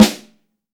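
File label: piccolo snare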